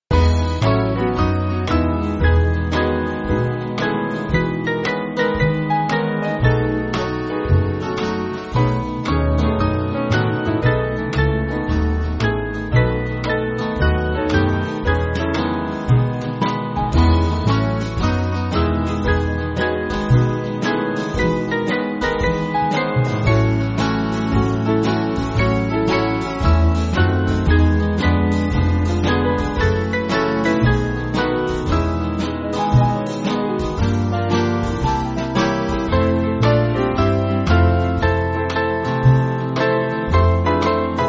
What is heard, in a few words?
Band, Music Backing; Loveless